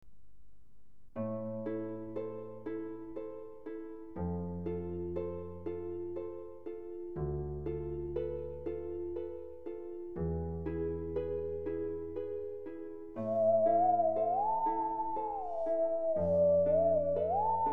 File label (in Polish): serenada, pila, skr; F Schubert Serenada